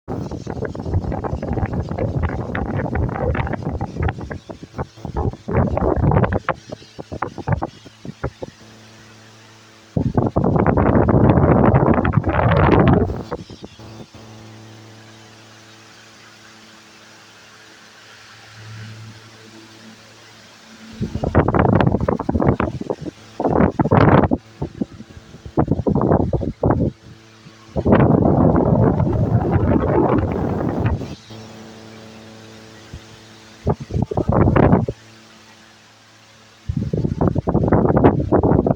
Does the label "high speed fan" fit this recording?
Yes